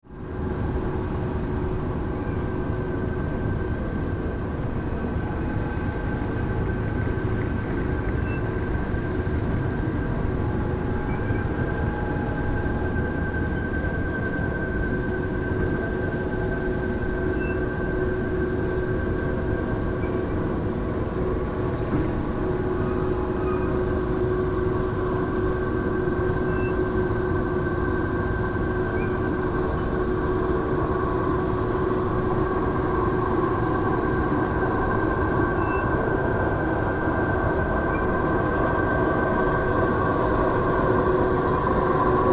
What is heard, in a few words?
caspian oil field soundwalk